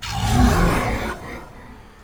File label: New Monster